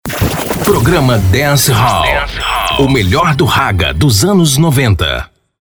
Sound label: DANCE HALL